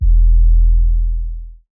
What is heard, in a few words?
808 D#, Basses; Cymatics; Eternity Sample Pack; 808s